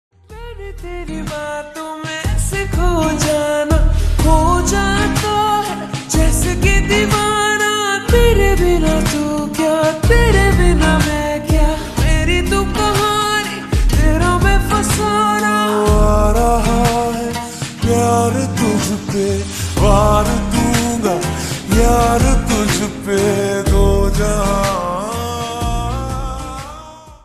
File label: Slowed Reverb Ringtone